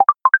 Message Notification

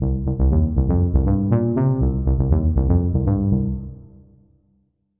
Jingle

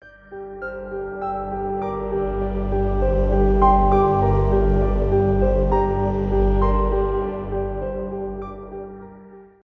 Suspenseful And Atmospheric Background

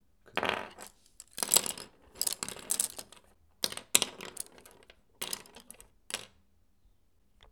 Pens And Pencils

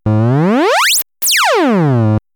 Buzz Ramp

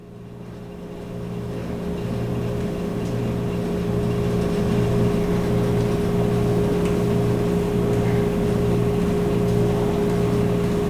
Vending Machine

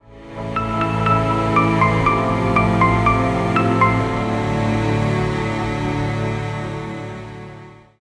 Beautiful Calming Audio Logo

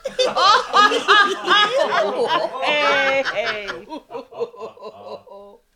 Big Laugh

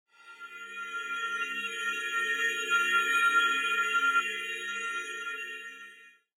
Glass Metal Resonant Transition

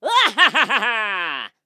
Cartoon Male Laughter Loud

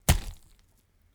Impact With Gore

